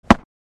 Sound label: Catching a rugby ball